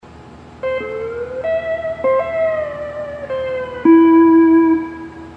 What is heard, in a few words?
hawaiian piece